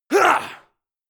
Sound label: male fight; schreie; menschen; soundpool